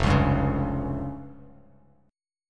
F Suspended 2nd Sharp 5th, Bass Standard; Fsus2#5 strummed; JGuitar

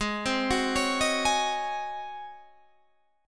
Ab 11th Ab Guitar Drop D; Ab11 arpeggiated

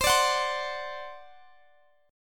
C Suspended 2nd Flat 5th C down Guitar Open D

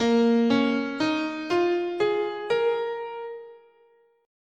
Bb Minor Pentatonic, scale

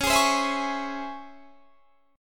C sharp Minor Major 7th C sharp, chord; C#mM7; strummed; 21,23,22,21,21,21

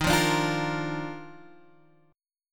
Eb Major 7th Suspended 2nd, Guitar Open G; 13,10,12,10,11,x; down

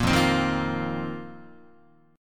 F 7th Suspended 4th F down Guitar; strummed

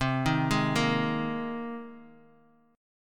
C Minor 7th Sharp 5th C Bass Standard; Cm7#5 arpeggiated; JGuitar

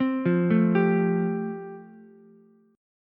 F Major Add 9th, Ukulele C6; Fadd9 arpeggiated; JGuitar

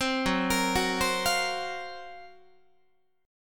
F sharp Major 7th Flat 5th, down, Guitar; F#M7b5 Chord; arpeggiated; 15,14,13,16,16,16

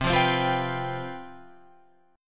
C Major 7th Suspended 4th C down Guitar Open F; CM7sus4 strummed

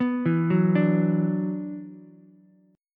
Gb 7th Suspended 4th Sharp 5th Gb down Ukulele; arpeggiated; JGuitar

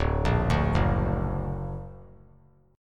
D Augmented D down; D+ arpeggiated; JGuitar

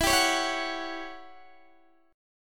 E Minor 6th Add 9th, Guitar, DADGAD; chord shape

